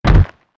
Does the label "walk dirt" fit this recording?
Yes